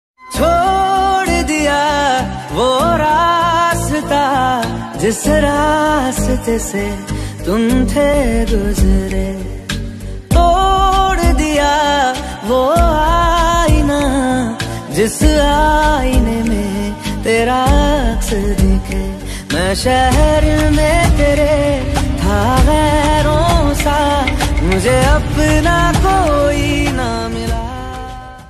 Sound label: Sad Ringtone